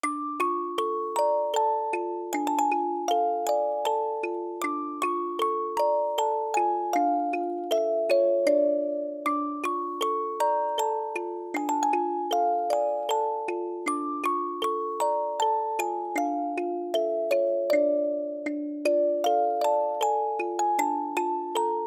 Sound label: Music Box